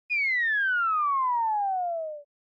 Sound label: Motion Falling Whistle Long